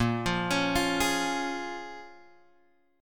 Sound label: Bb Minor 7th Bb, Guitar, Open G